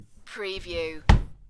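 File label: Dresser Drawer Shut; Sounds in the home of drawers being open or shut.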